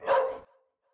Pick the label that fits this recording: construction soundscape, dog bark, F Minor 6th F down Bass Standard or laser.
dog bark